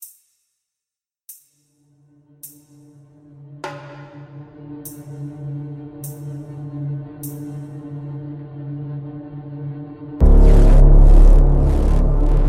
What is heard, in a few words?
Background Score